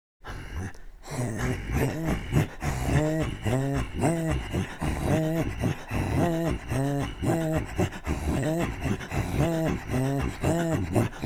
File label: Throat Singing, INUIT; Harnga